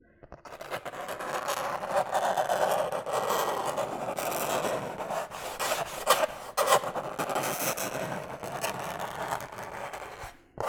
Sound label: Nails On A Chalkboard